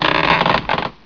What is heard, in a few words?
ice crack